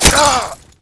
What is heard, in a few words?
axis die; dod